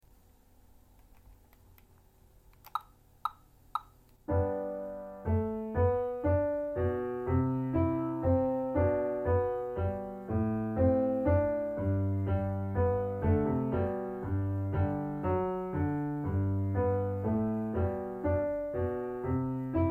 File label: menuet in g major secondo